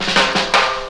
Fills; AMG; Now CD-ROM (Roland)

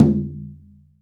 CHINA TOM; AKAI S-Series CD-ROM Sound Library VOL-5

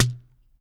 LO AFRO SH1; Spectrasonics; Liquid Grooves 1 & 2

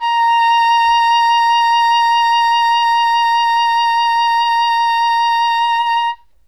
English horn A#5; Q Up Arts; Denny Jaeger Private Collection

